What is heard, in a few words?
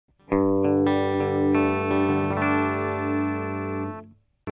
Audio-Technica AE3000 Clean (Bridge), guitar